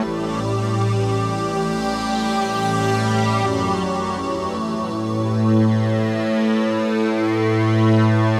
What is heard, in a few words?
sweeper, Loops Electronic